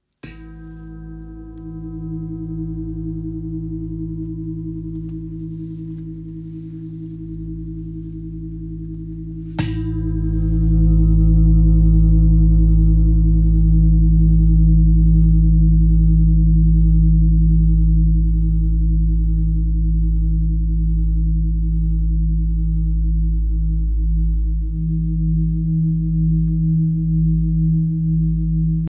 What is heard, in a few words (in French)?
Gros bol sol diese 10.86kg; Bol chantant gros volume 4kg et +